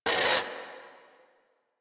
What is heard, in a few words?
Catbrow Combat Vocal Pain; Warframe